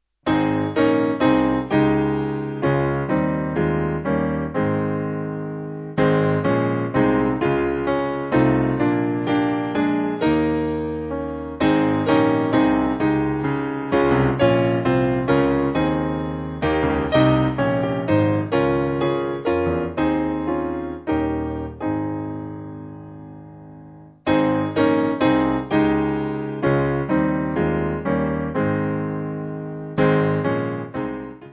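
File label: Piano, church music backing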